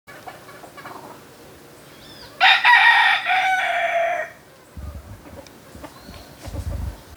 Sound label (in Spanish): gallo cantando